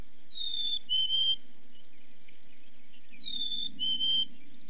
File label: chickadee feebee; friendly chickadee